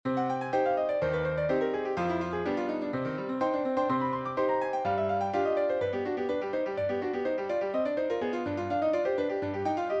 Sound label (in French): etude pour piano